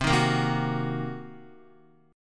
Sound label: C Minor 7th Flat 5th, Guitar; strummed; Cm7b5